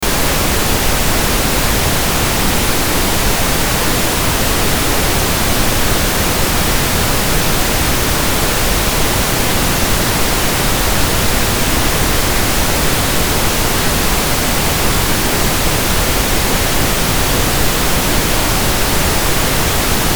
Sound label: Pink Noise